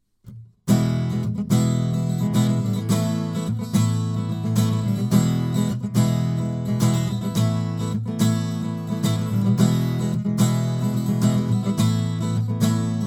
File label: AKG C414 TLII Acht